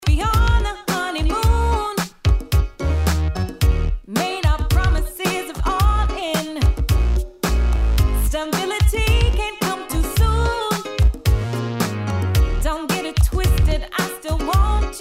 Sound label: On A Mal Dit, for guitar